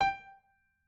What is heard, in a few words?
piano